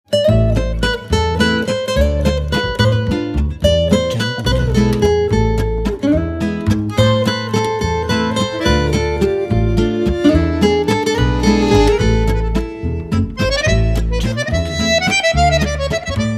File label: Paris Romantic Cafe